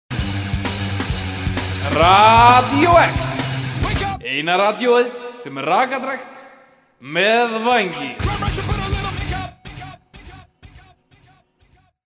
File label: jingle; Radió-X